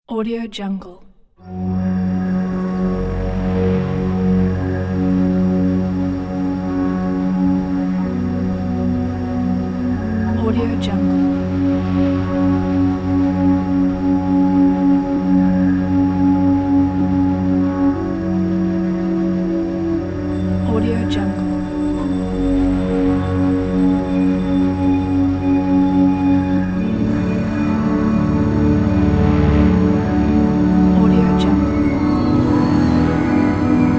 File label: cinematic ambience flute